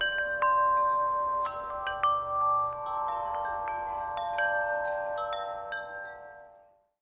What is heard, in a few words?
calm bell